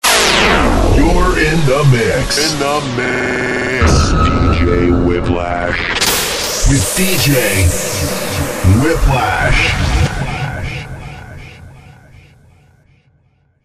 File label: dj whiplash